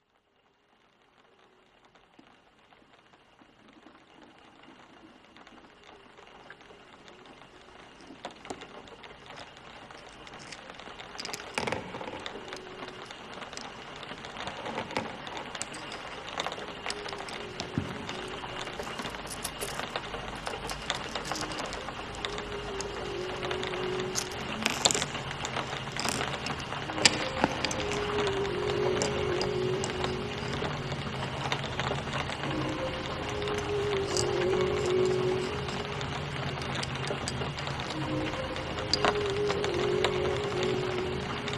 Mineral Bonding, Nature Sounds; Out Of Limit 3 - Nature Sounds

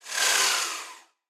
Monster; Zenless Zone Zero

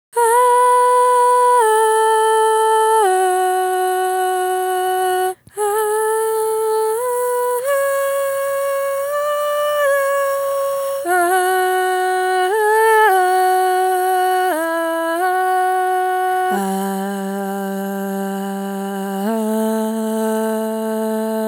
harmony (left pan), Death Vox

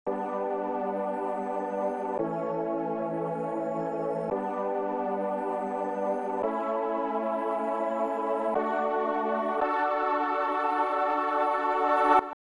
chords, 113BPM; Pony Fan Music Remix Stems

